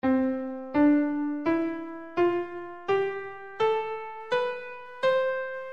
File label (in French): gamme de do ascendante